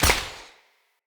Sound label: hunter bow shot, enemy bows weapons